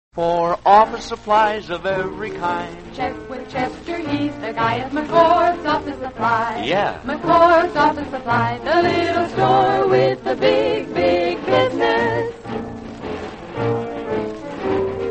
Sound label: radio jingles; mcchords office supplies; allied radio artists